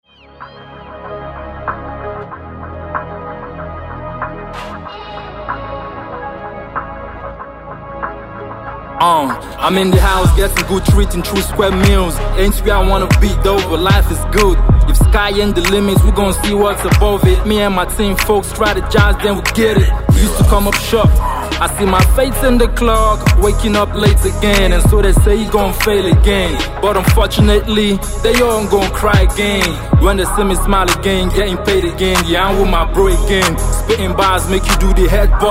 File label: Rap